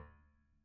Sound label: Piano No Sus Rel Close D2, Grand Piano, Steinway B